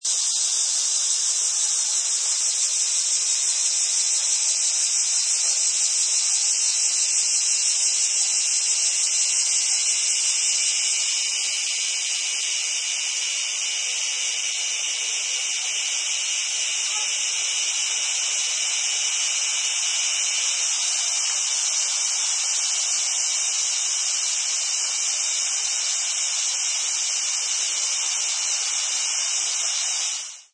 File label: Summer cicadas singing; Insect Sound Effects; Sound Effects:Animals